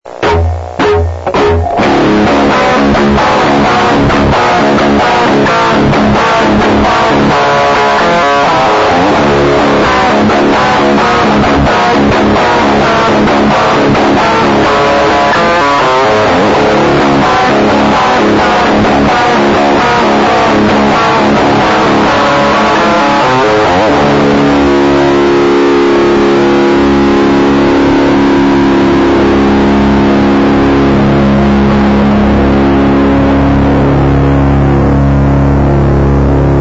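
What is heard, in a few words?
sustain, Custom Guitar Pedal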